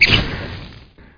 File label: Monster; Conquer Online 2.0